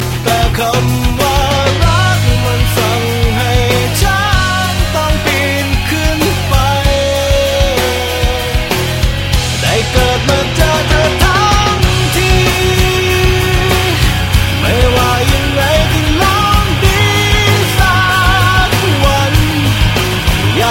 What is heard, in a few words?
thai rock ringtone